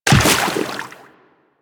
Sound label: Run Water, Footsteps; DE; Warframe; Tenno